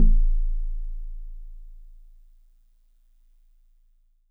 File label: Celeste DX100 A1; Pristine Celeste